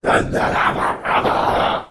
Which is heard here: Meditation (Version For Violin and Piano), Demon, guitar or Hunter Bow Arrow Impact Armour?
Demon